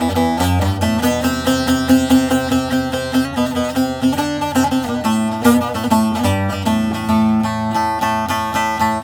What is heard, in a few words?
saz A2; PH SAZ